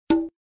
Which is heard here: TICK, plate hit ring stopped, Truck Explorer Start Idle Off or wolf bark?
TICK